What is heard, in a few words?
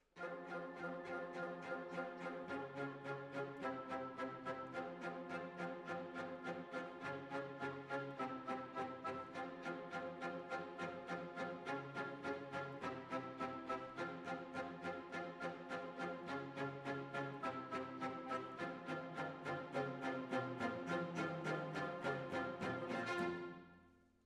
Decca, Jazz for piano